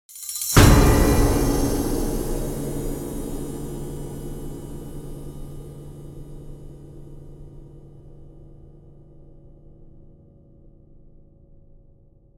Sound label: String; Assassin's Creed Unity